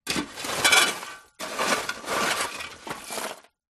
foley charcoal movement dig metal shovel in metal fire pit; zapsplat